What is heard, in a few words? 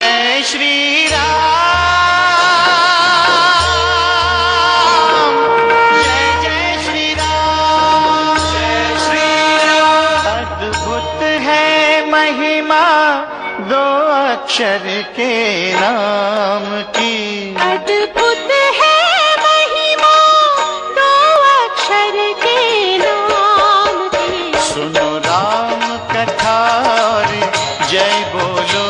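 devotional Ringtone; Bhakti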